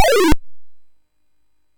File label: sid MOS6581R4; Frogger II-Three Deep